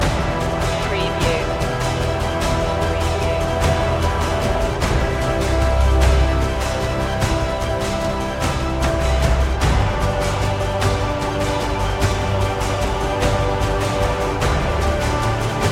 epic hymn loop

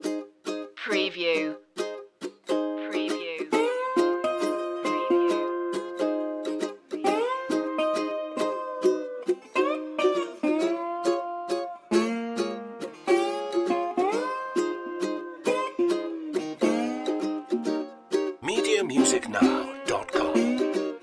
tiki beach loop

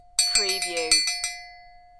Cowbell

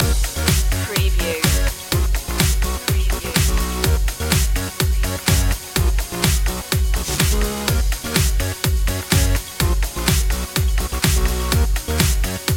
pop till we drop loop

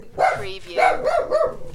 Small Dog Bark

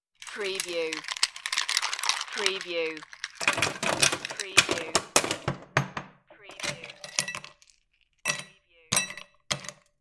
Ice Cube Sounds

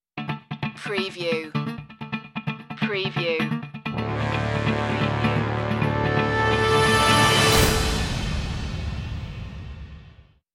sting no choir

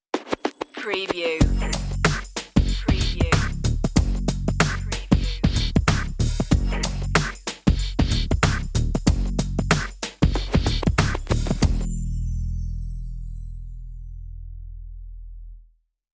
90s hip hop sitcom sting bass drums only

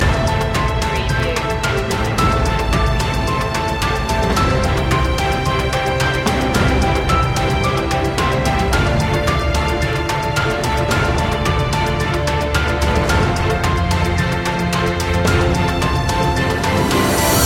victory in the battle loop; media music now

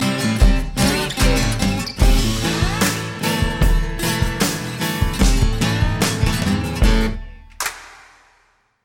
rattlesnake sting

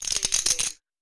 Poker Chips Stacking Chips In Hand

